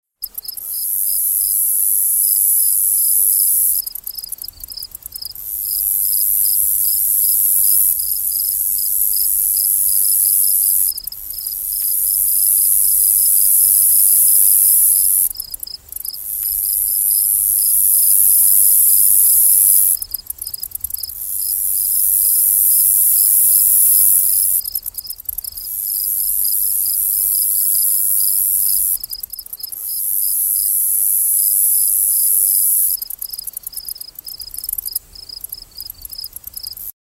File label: locusts; plague